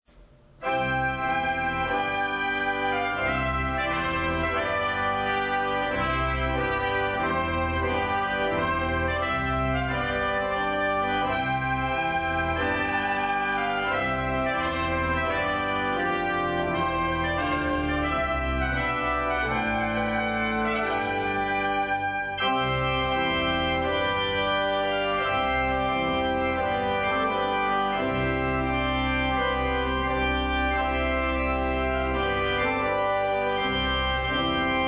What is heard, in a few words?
Trumpet Tune